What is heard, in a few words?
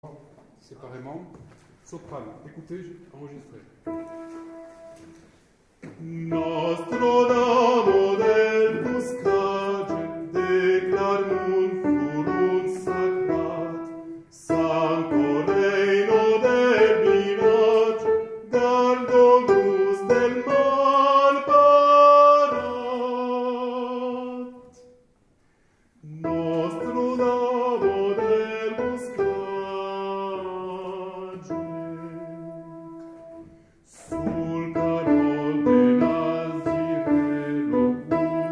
Nostra Dama del Bocatge Soprani